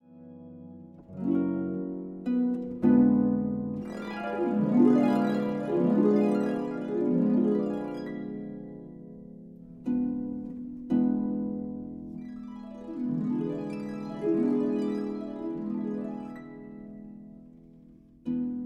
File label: Harp; Hasselmans; Harpe d Éole